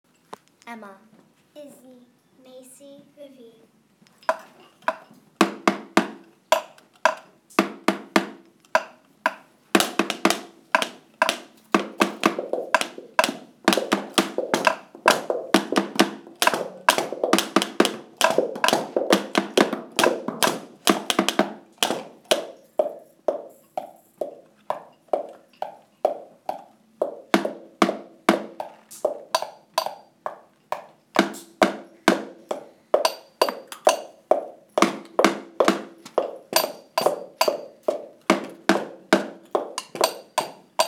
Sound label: found instruments